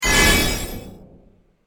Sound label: Fantasy Fx As If By Magic; fantasy sound effect; role playing games, magic videogames; Andrew Fleming